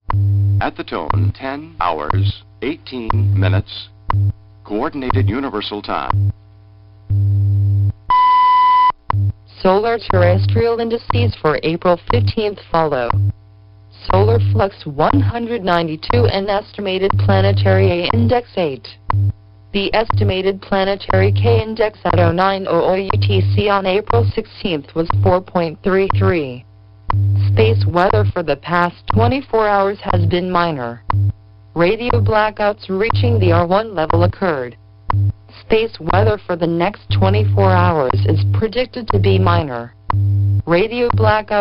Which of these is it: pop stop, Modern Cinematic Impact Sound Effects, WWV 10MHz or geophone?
WWV 10MHz